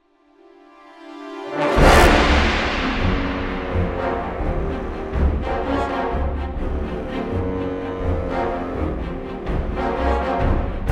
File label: sine; Cue Demo; Berlin